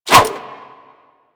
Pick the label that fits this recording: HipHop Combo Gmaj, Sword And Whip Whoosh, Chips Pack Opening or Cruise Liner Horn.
Sword And Whip Whoosh